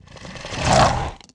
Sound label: Idle, Jaldari Mutant, Aliens